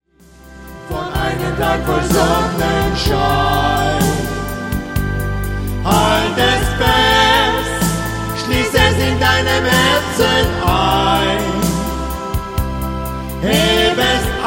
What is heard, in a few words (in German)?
Duett